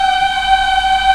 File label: VOX African; Roland LCDP11 Africa VOL-1